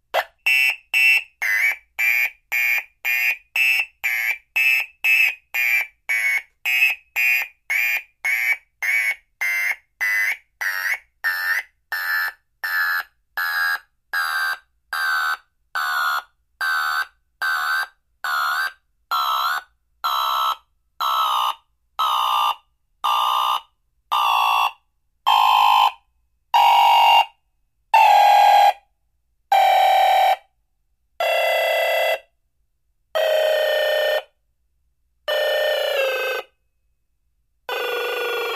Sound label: Alarm Car Type 1; Modulating Fast Beeps And Chirps Speed Up And Slow Down, Alarm Slows Down And Starts Again 3x